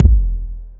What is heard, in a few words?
Ball Collision Geo Extra Hard